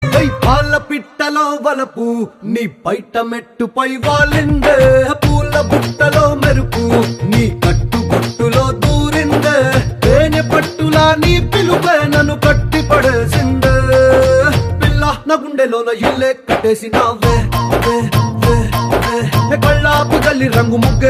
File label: Song Ringtone; Maharshi 2019